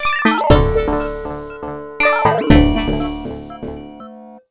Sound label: Jingle Game Over; Level 22; PS Vita